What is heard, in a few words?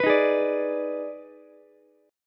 D sharp Major 7th Suspended 4th Sharp 5th D sharp Ukulele, chord; strummed; 16,15,16,17; JGuitar